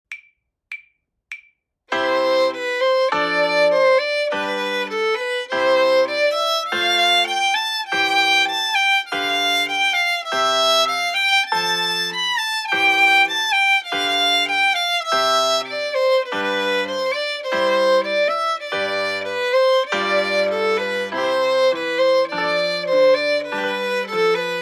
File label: Etude with violin; The Violin Fun Book (Level 2)